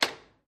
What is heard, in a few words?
Button Pushes Metal